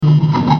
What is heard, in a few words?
open; Oddworld